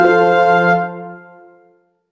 day good

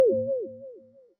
warning

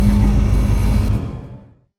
build coal

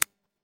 ui click

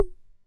bid lose

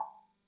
block tick

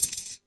coin

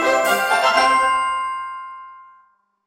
level won

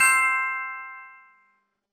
achievement

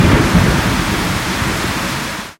event storm

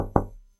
door locked

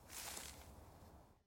build forest

